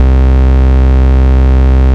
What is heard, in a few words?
Ultimate Bass Root C; Vengeance Samples